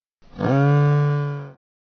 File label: moo; zoochosis; lazynap; dolphin deepsea monster